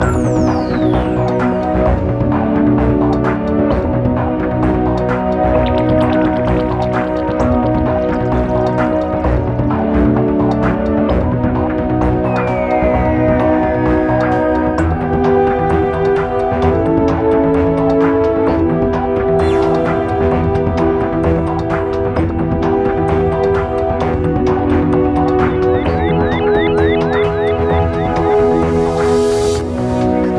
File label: Cluck Menu